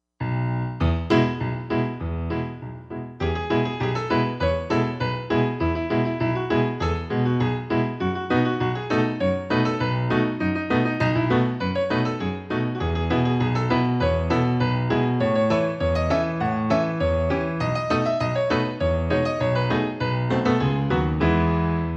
piano

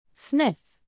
sniff

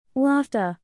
laughter

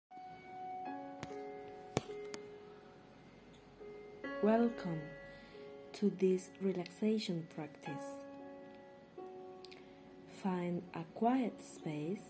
relaxing Music

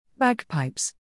bagpipes

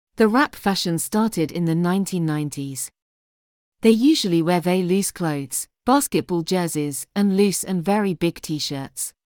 rap

